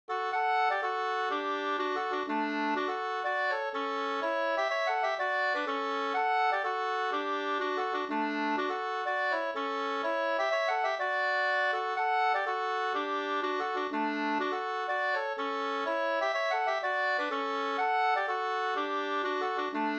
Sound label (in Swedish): polska